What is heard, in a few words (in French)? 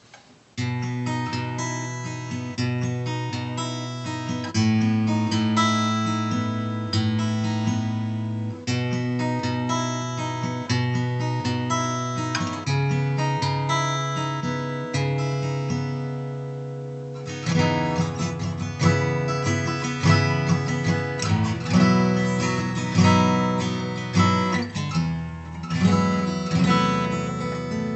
strum leger dread